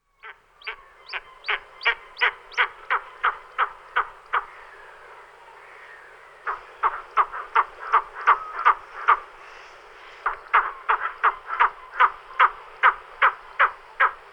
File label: white tailed eagle